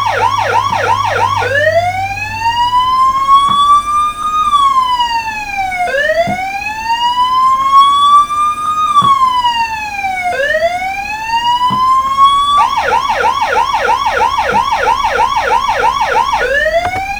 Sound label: police